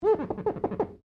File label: GLASS SQUEAK FINGER SEQUENCE